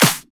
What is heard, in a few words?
sharp clap sound d sharp key